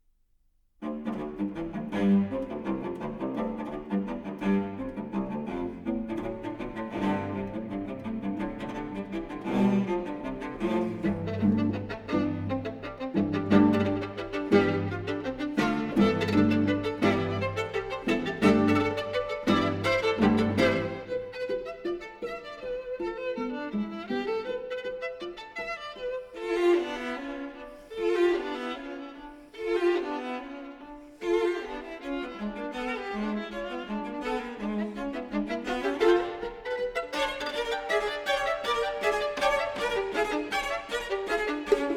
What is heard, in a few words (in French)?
Sonate pour violon et violoncelle, IV Vif, avec entrain; Classical; Chamber Music